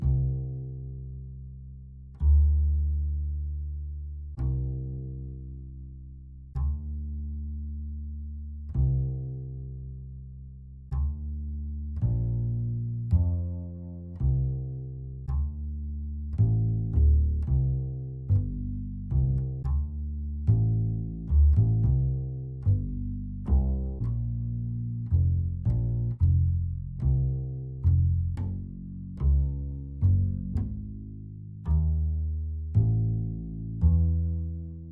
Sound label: bass; Toki Tori 2+; Toki Tori Collection